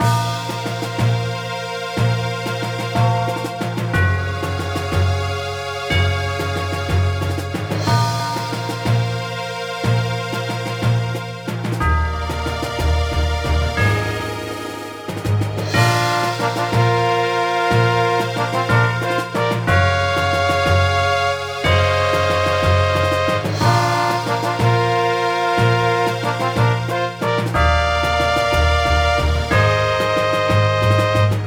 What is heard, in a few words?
Bgm lobby survival run prematch